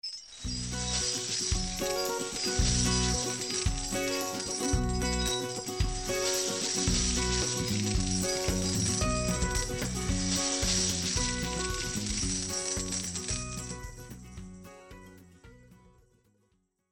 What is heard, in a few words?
treasure Chest